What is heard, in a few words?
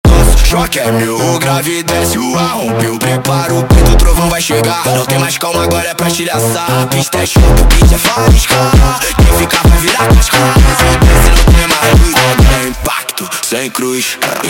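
sped Up, phonk music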